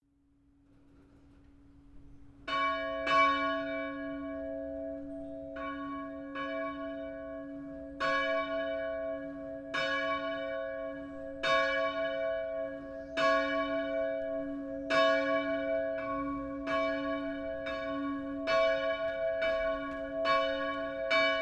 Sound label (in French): volee, cloches; campanologie; chenebourg